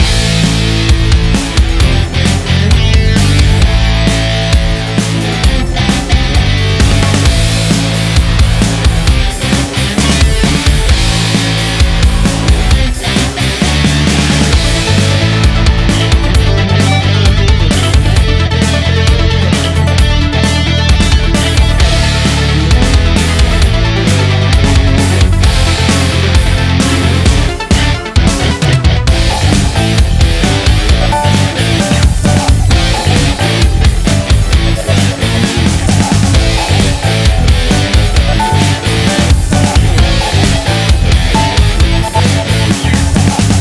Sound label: Flyby; Honkai Impact 3RD or Houkai Gakuen 2; Houkai 3rd - Impact - Original Soundtrack